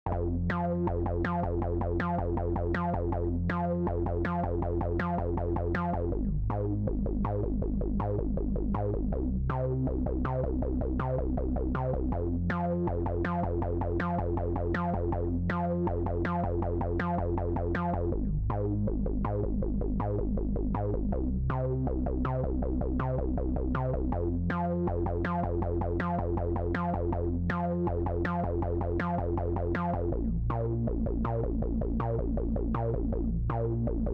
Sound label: Phat Phunk no LDS FX Normal no Lim; TB Lowtone; 13 dBFS